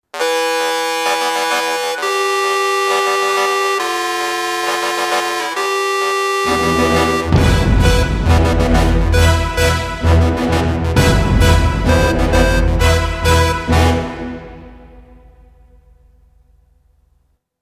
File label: Menu Music; Dust Defender